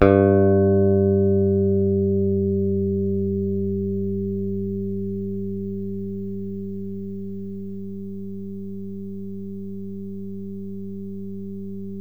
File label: PICK BASS, Electric Basses; PlatinumPhattCD2; E-MU Producer Series Vol. 8 – Platinum Phatt